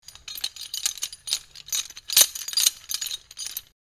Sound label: Boat anchoring metal chains movement clinking